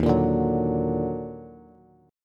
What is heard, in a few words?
D sharp Minor Major 7th D sharp down Bass; strummed; chord